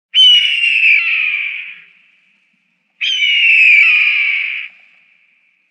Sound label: redtail